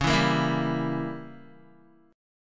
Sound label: Db Diminished 7th Db down Guitar; Dbdim7; strummed; tuning=4F,4A,5C,5G,5C,6E